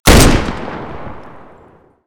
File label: fire; STALKER; starwars; hecate